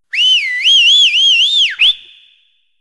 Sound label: whistle